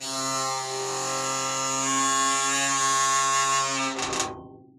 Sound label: creak, metal